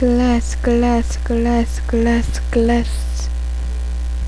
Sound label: glass